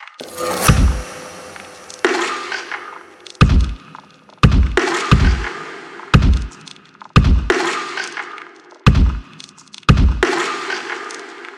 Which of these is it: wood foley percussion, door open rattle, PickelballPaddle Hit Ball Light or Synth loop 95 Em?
wood foley percussion